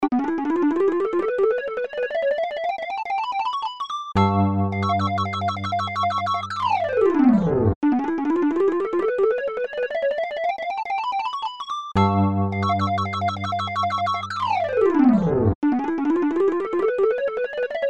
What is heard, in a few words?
e piano loops